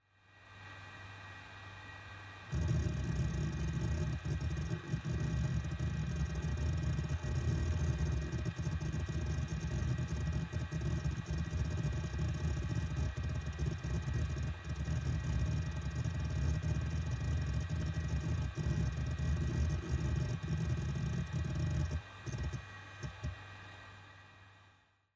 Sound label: Hard Disk Drive Operating Noise